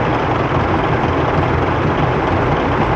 tank move loop; Fieldrunners

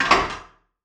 ui transition back; Opus Magnum

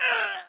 unit soldier death; Fieldrunners

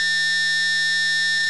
c4 beep; Counter-Strike 1.6

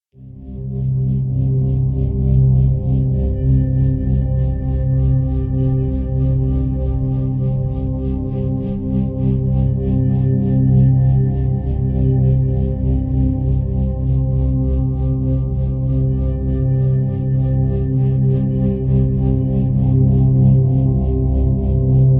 Ambient Drone; Hacknet

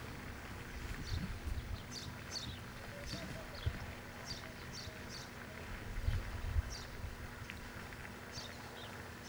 ambience nature; Kerbal Space Program

